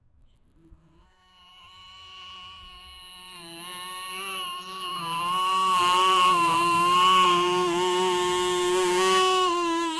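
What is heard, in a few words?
mosquito sound effect, insect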